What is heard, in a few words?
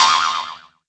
CYMBL; tenpin